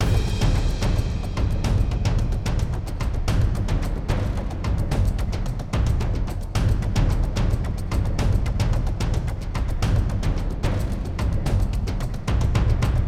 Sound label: Garden Track(PERC)